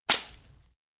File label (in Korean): Fights, 싸움-전투; 헐리웃특수효과음